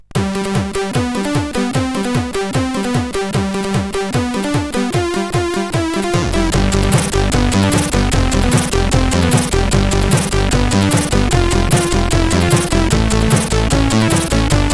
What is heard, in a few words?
Swing, sid CSG8580R5; SoedeSoft